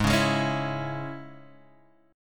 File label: G sharp Suspended 4th Sharp 5th G sharp, Guitar Open D; 6,4,6,7,7,x